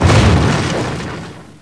EXPLOD; Caesar III

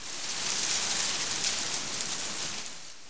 WHEAT; Caesar III

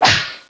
sword light; Caesar III

